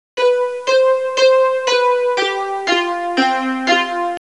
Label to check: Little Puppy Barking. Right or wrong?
Wrong